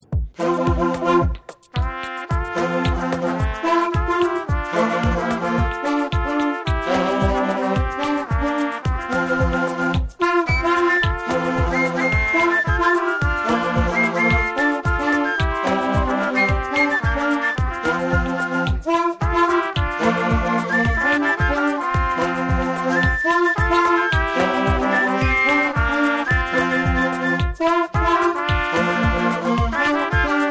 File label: vuvuzela orchestra